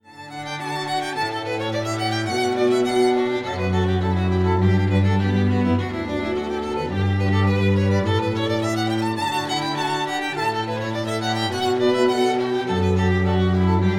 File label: Trio, Kanon; Mosso; Kanon Pachelbela